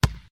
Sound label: Bounce